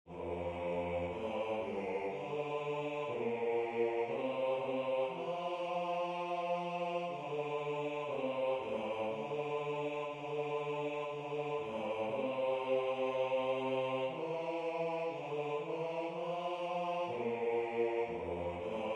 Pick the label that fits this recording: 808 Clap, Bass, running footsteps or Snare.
Bass